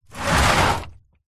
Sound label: industrial 10 litre plastic drum water heavy slide